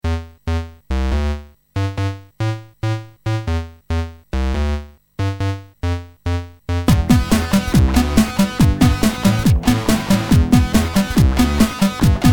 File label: grunge rave song